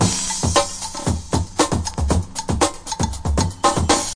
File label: 114 bpm crashing funk out, drum loops and breaks